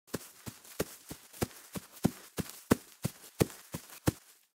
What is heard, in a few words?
Walking on grass